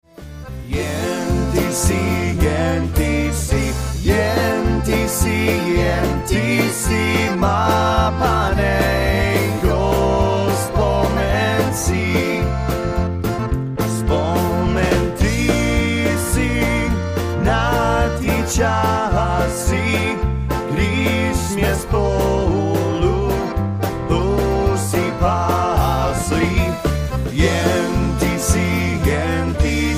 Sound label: Polka